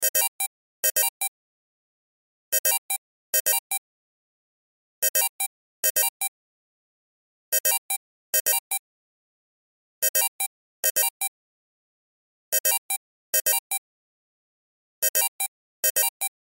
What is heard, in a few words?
Cell Ringtone; Loop; Technology; Cell Phones; Electronics